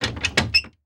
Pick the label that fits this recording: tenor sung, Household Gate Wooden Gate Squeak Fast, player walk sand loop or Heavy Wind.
Household Gate Wooden Gate Squeak Fast